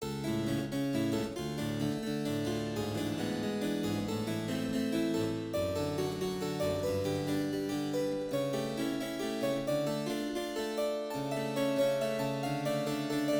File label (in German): Cembalokonzert